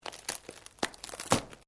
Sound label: Branches Dead Strain; Material components for constructing more complex sounds; Breaking (Sound Effects:Objects)